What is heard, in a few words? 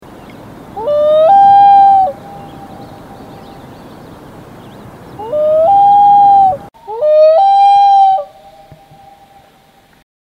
Loon Call, the call of a lonely loon at dusk; sailing; usually at dusk or dawn; Loony Tunes – Sailing Fregata